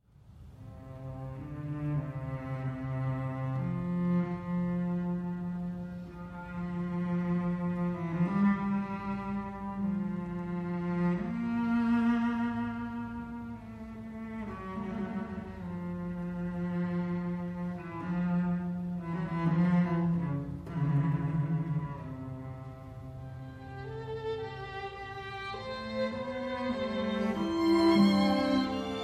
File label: String Quartet Adagietto